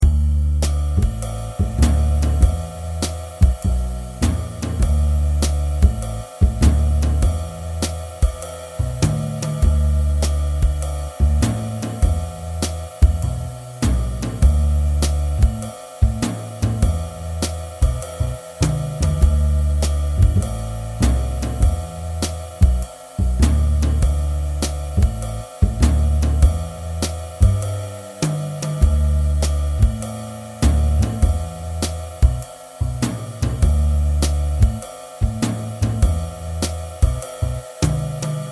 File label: jazz finger style 2 5 3 6, guitar